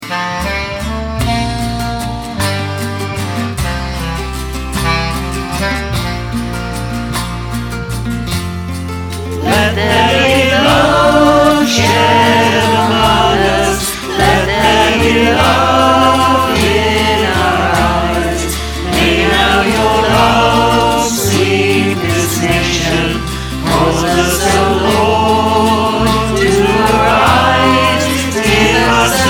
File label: choir